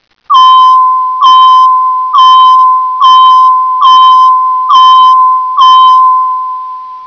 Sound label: beep